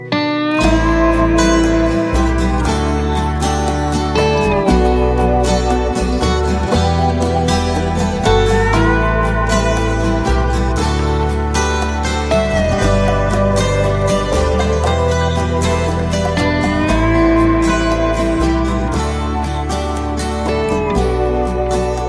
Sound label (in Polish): gitara solo; Electronica